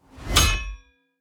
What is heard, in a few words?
miss; lin gang; adanmech